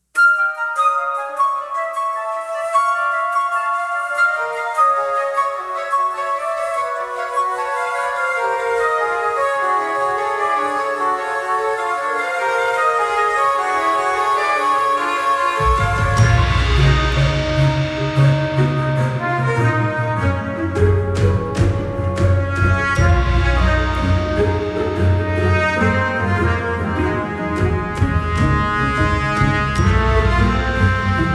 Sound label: Orchestra Proximity